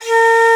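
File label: soprano bansuri, World Winds; E-MU Producer Series Vol. 7 – Old World Instruments; World Combos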